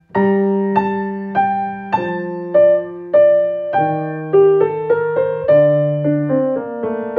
Minuet in G Minor; Bach

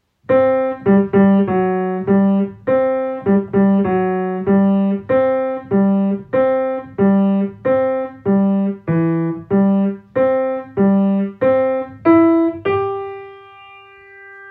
March militaire no 1; beginner; Schubert

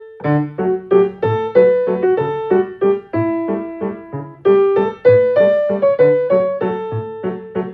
Italian Folk Song; Tchaikovsky

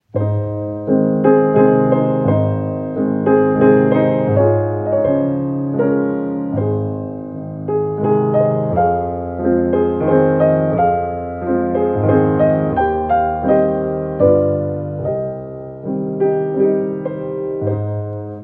Waltz Op 39 No 15; Brahms

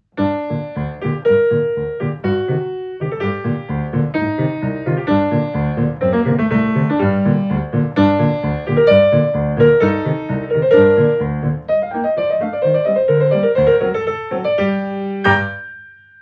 Hungarian Dance No 5; Brahms

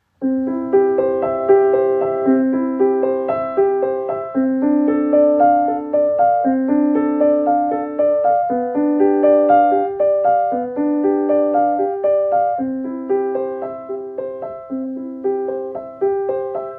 Preludium C Major; Bach

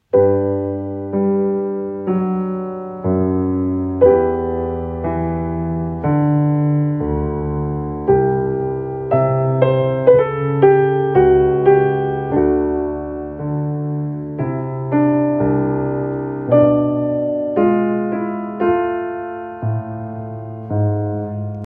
Air; air on a g string; Bach

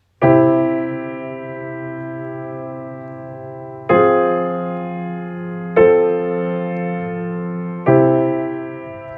March; from Clockwork Orange; Purcell

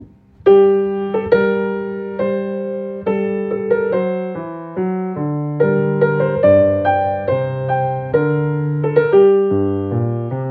Polonaise in G Minor; Bach

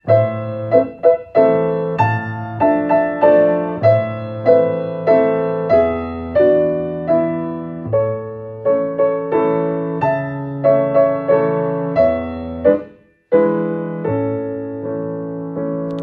Waltz in A Minor; Schubert

